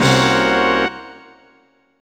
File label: Hit; Banjo Kazooie